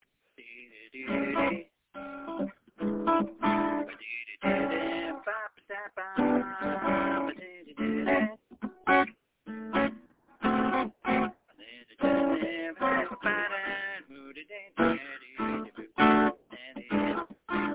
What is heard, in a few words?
tin pan western swing alley style